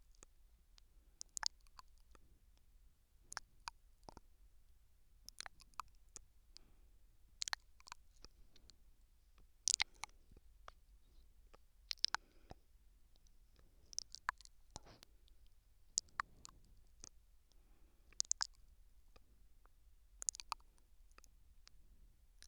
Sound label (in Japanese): 左耳 甘噛み